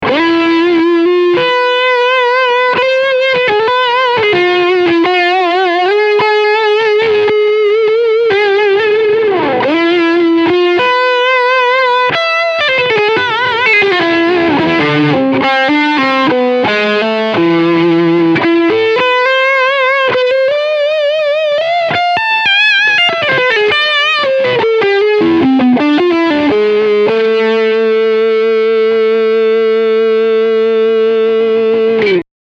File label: Thick Gain